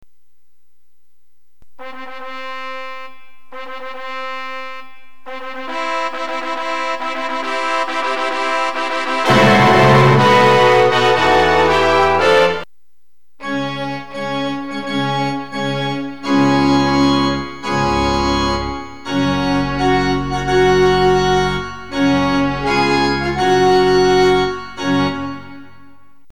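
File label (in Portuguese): teclado; contrato para casamentos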